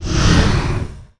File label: Frostwyrm attack; 冰霜巨龙; 魔兽争霸3; 亡灵; Wyrm Breath; 冰龙吐息